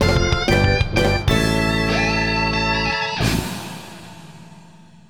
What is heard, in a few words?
music school ending